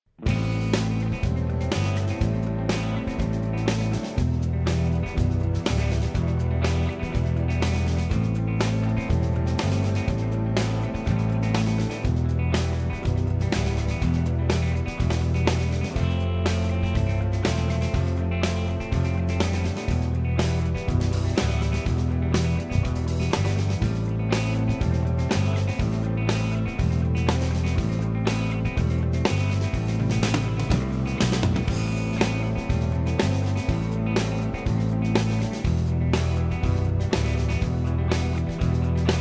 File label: backing track